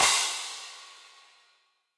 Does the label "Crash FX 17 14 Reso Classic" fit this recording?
Yes